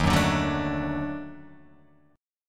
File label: D sharp Minor Major 7th Flat 5th; x,6,4,7,6,4,4,x; chordshape